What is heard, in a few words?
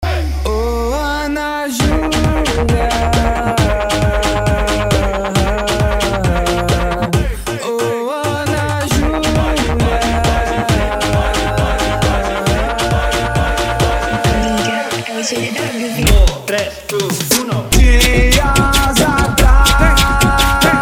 funk